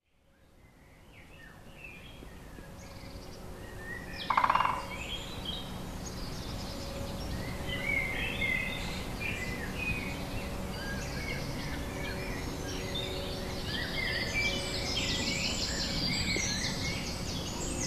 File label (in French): Pivert et autres oiseaux pres de la lone; MZ-N710; a Lherbette